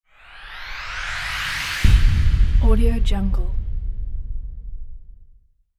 epic swell impact

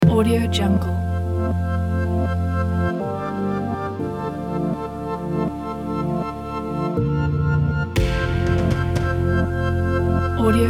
motivation background

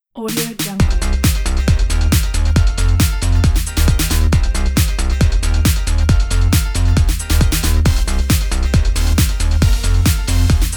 fast dance pop logo

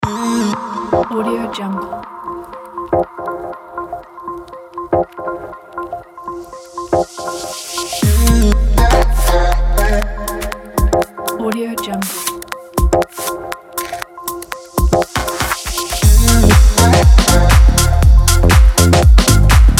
fashion show deep house

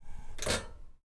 stove clicking

